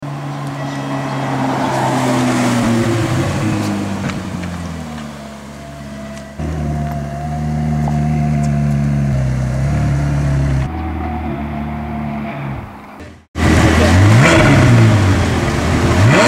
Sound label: McLaren 540C